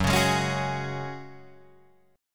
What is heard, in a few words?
Gb Suspended 2nd, Guitar Drop D